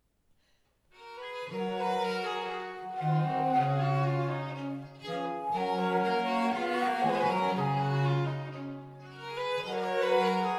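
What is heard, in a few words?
Arrangement for two flutes, two violins, viola, and cello; ninette a la cour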